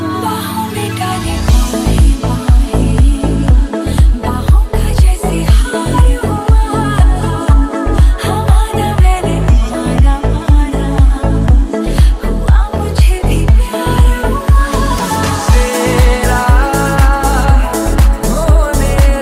DeepHouse, ringtone

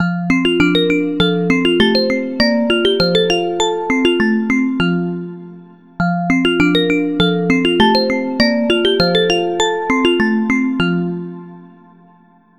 ringtone; xiaomi

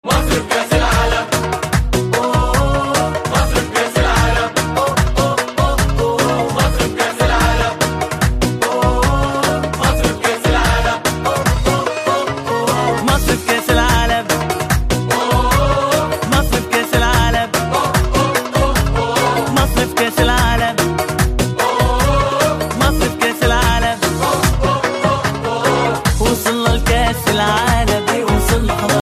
Arabic ringtones

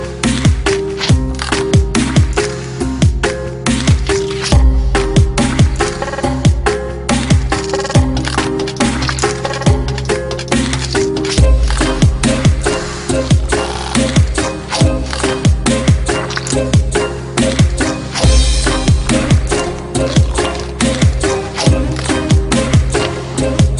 dubstep; apple

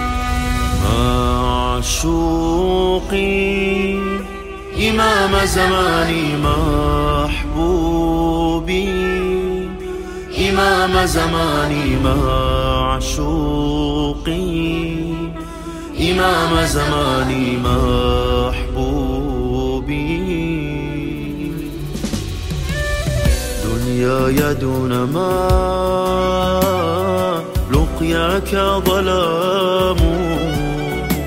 Arabic, ringtone religious

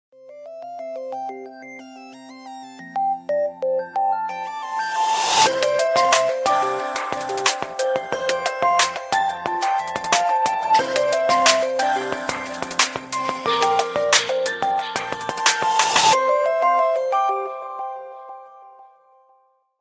ringtone, phone, samsung galaxy s3

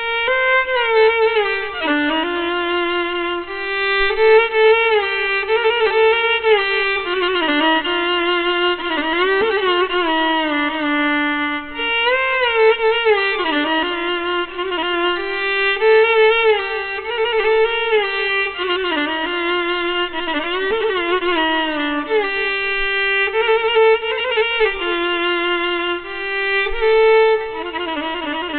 Sad Ringtones

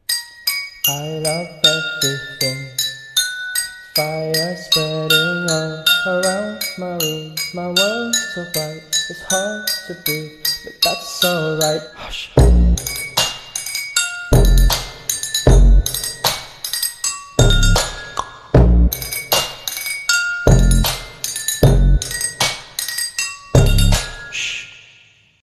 cradles, ringtone; xiaomi

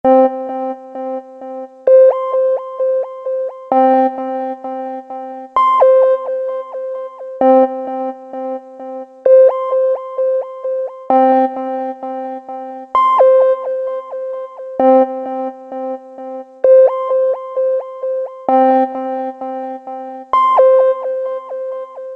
office vip tone

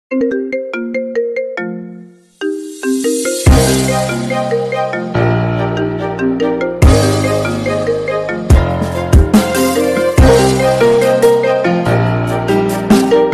iphone, ringtone; apple

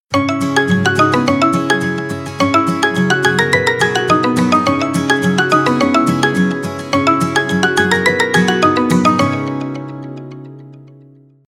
Happiness, ringtone; xiaomi

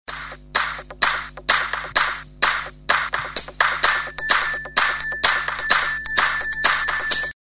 chime remix tone

